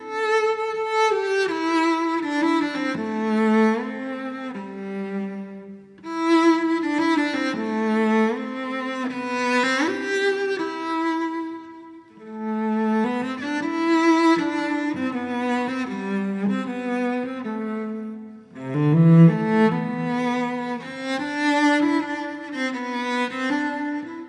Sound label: Music Level Discovery Layer